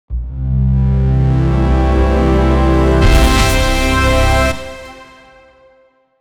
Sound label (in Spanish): sonido para un logo tecno